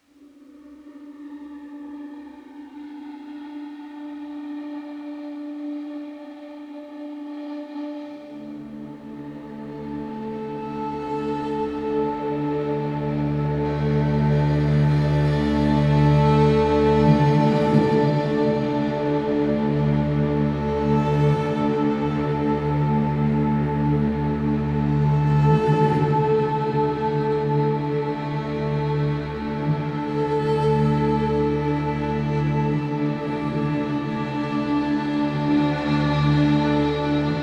Underscore, Piano Noir

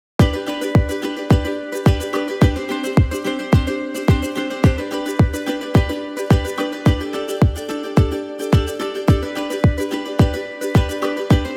Happy Islander Underscore, Summer Daze; mellow island beats; Narrative Mind

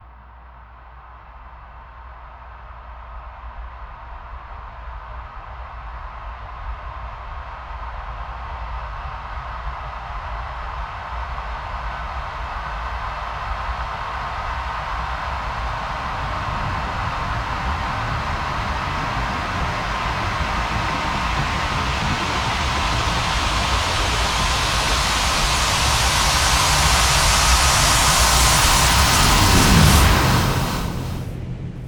Dramatic, alarming riser effect; Narrative Mind

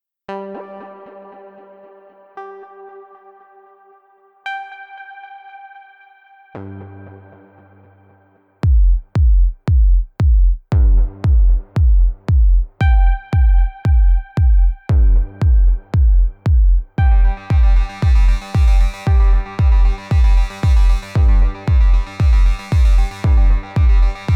Underscore, Pulsing Motion; driving pulsing electronica

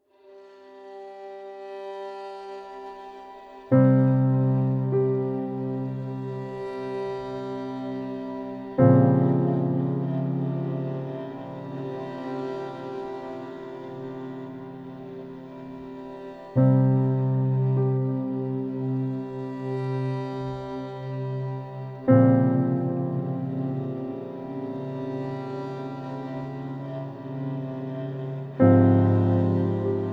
Underscore, Piano Noir; Narrative Mind

